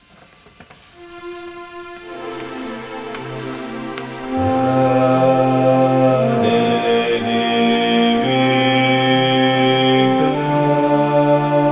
Pane Di Vita Contralti

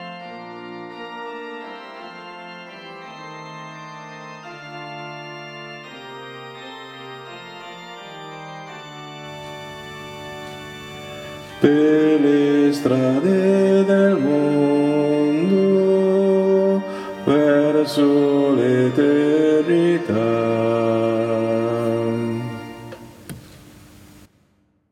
BASSI

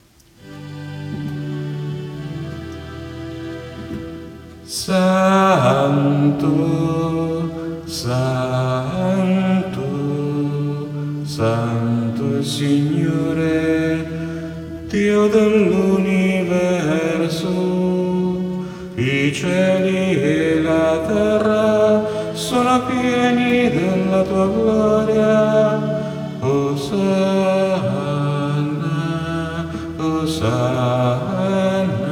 Santo Uomini

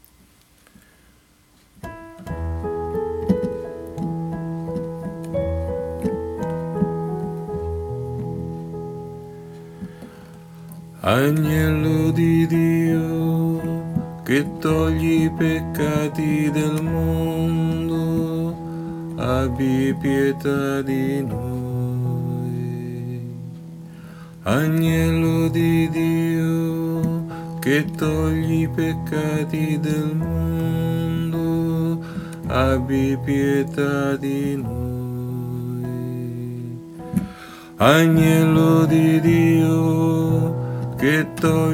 Agnello Di Dio Contralti

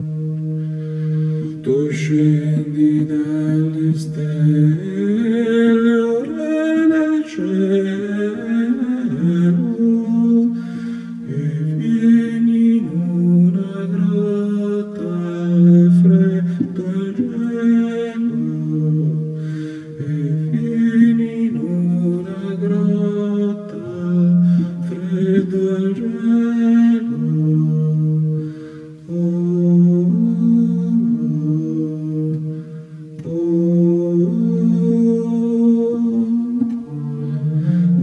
Tu Scendi Dalle Stelle Soprani

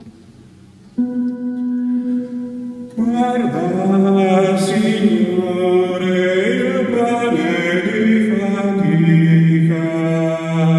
Come Pane Nuovo Contralti

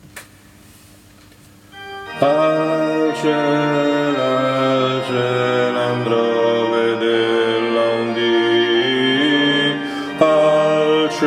BASSI, coro

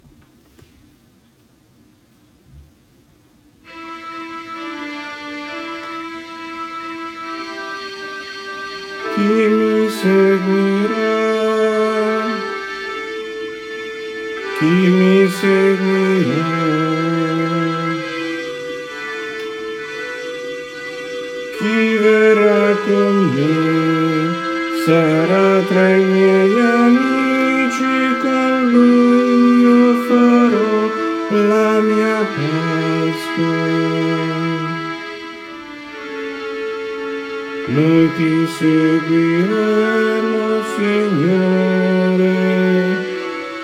Chi Mi Seguira Uomini

